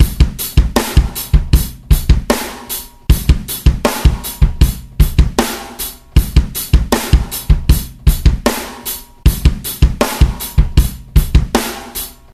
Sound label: scratch drum jam, guitar